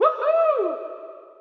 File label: WOOHOO; powerboat; Smash Disk